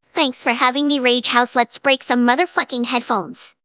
rage

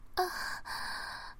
hehehe

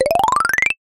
cartoon climb fast steps ladder cute; zapsplat

